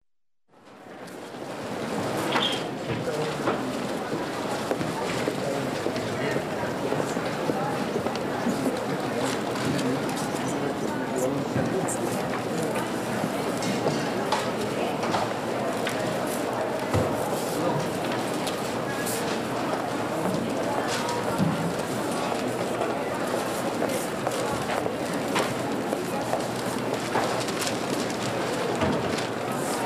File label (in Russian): magazin obshaya atmosfera universama